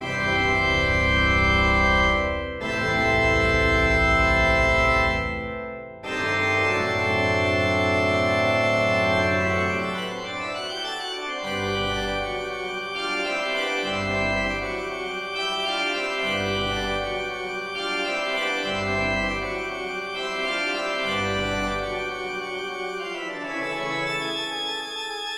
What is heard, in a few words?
Kyrie, Orgelmesse